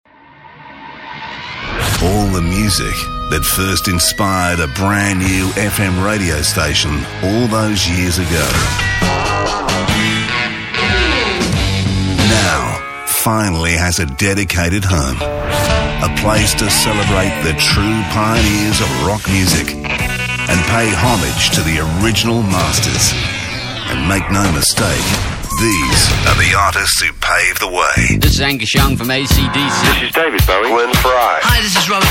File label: classic rock